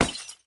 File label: Glass Shatter; Lethal Company